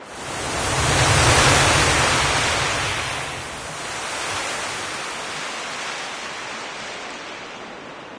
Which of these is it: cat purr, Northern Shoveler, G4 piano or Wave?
Wave